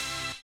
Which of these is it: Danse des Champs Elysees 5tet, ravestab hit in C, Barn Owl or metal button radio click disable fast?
ravestab hit in C